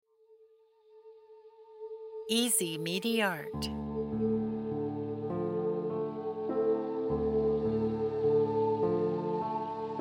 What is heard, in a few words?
ambient cinematic piano